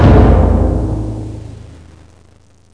Timpani; EQ

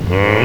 huh

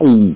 gulp!; charley cat catch a canary

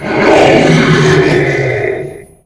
boss pain; Zaero; monsters; bossz

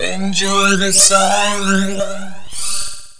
silence

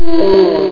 die howl; dosgames; roc

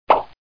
pick

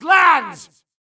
yells

